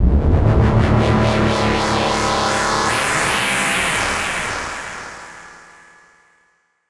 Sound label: Synth Sting; cinematic drama; Stings; musicradar